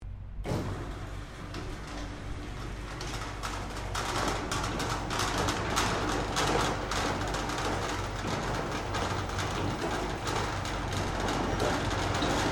Door Roller Door Docking Bay Closing Stereo, Medium size loading bay roller door; Miscellaneous Doors